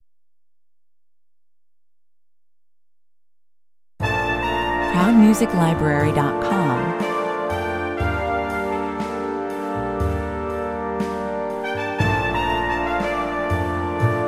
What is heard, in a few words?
sunrise trumpet